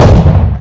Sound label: barrel, weapons